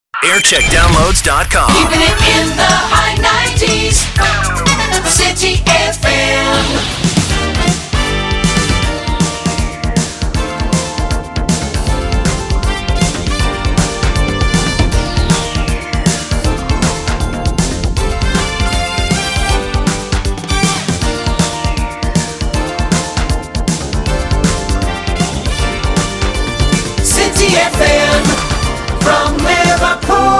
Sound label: jingle montage; city fm